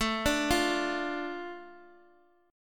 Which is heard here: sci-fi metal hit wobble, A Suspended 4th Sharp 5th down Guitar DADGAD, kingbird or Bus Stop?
A Suspended 4th Sharp 5th down Guitar DADGAD